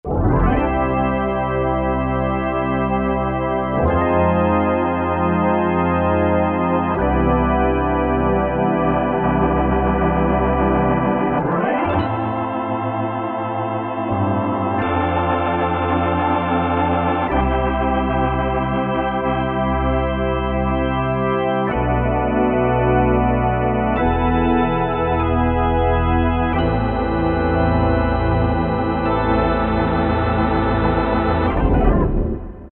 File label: Mega Drawbars